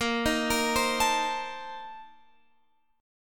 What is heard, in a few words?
A sharp Major 7th Suspended 2nd Suspended 4th A sharp Guitar Open F; arpeggiated